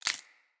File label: Root Spider Step Dirt, Foley; Animals